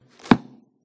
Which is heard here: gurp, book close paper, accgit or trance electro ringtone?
book close paper